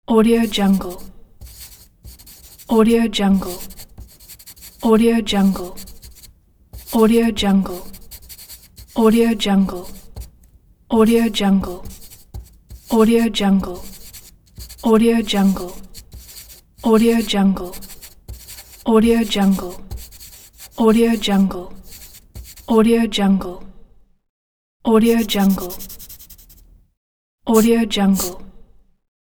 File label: pencil writing on the paper